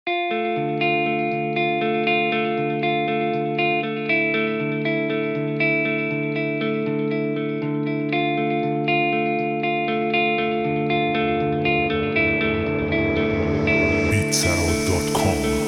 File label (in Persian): بیت رپ راک